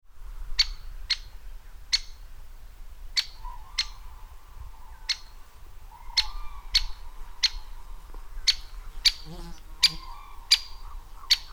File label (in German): Buntspecht; Vogel